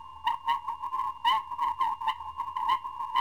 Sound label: sonar; Soldier Of Fortune II Double Helix; XBOX; ambience